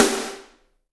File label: SD Real, Roland; Sound & Vision - Gigapack I